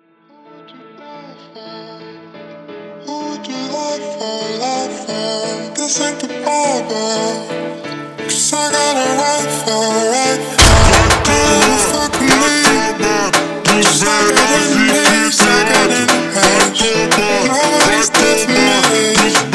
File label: Funk super Slowed